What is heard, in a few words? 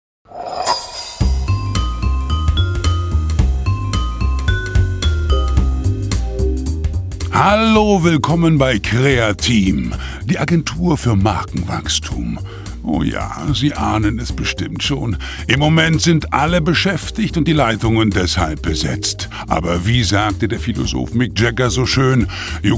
Telefon House